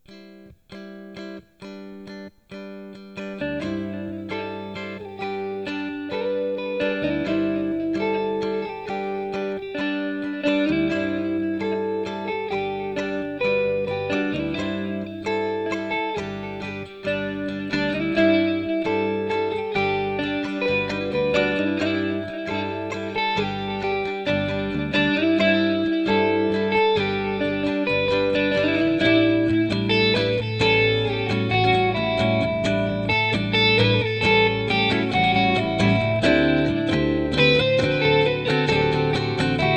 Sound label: pretty clean guitar idea with too many solos